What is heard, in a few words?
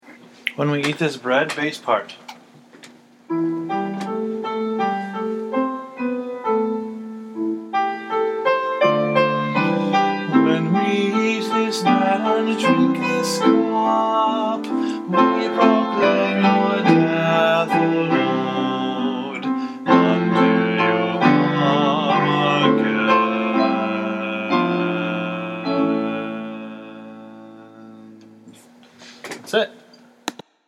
bass